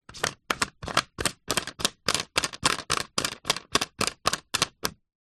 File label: foley bottle plastic lid turn many times